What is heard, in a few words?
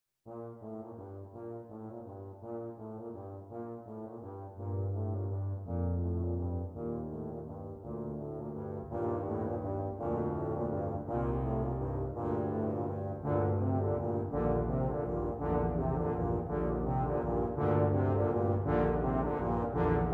bell carol tuba; ukrainian